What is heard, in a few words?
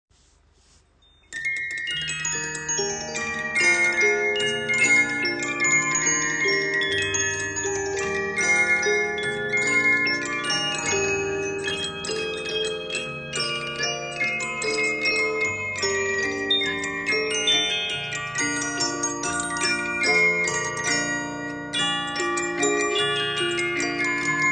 mazurka; Diliers; l'Espagne